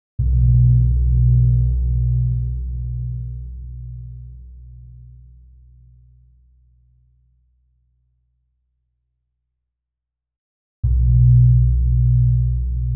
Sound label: Gamelan; Propeller Island - Complete Gamelan